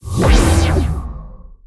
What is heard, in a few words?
command block pulse